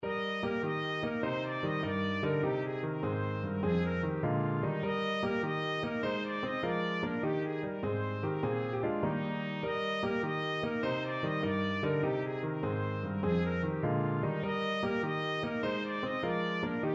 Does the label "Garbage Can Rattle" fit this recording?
No